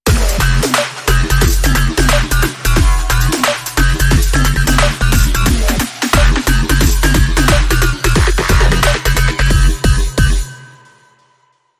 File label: Link Sting